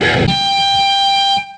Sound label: klavier damage